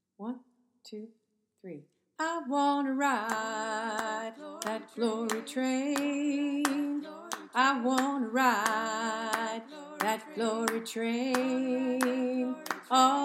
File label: lead